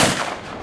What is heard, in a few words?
pellet spawn